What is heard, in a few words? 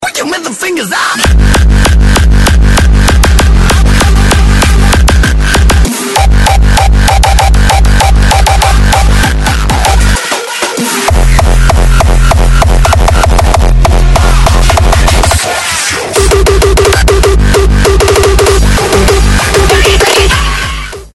frenchcore